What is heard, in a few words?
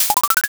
board flip